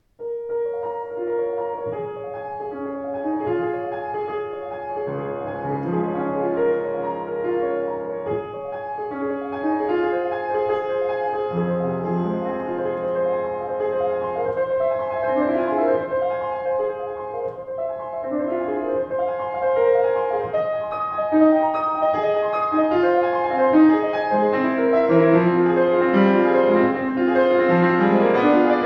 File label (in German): Schimmel Kirsche Fruehl, Klavier; 117 schimmel kirschbaum